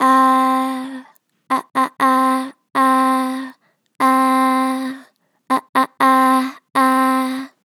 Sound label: Gen Vib Ah Ah, Vocals, Natural Vibrato Gentle; Harmor Vocals